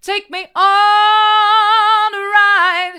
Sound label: take me on a ride hard, vocal